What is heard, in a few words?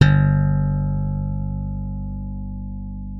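left thumb G1, slap thumb; Xsample VOL-1; 90 s Sample CDs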